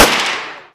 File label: Inf Weapons; Red Orchestra 41-45